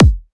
kick layer

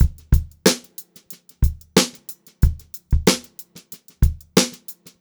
BEAT, Funk-Groove Drumloops

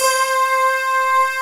LINEAR SYNTH

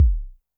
korg trident

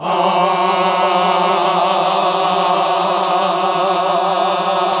GOSP. AAAHH

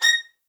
MED ATT A#, soft violins; Q Up Arts; Denny Jaeger Master Violin Library

